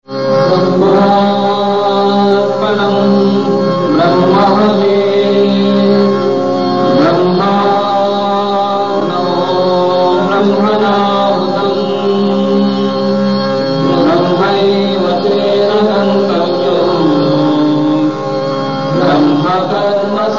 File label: brahmarpana Chant